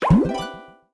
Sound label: Wand Magic